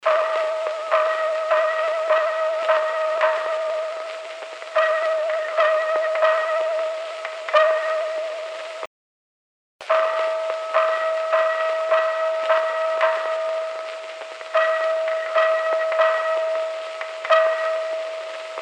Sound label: gong speed stabilization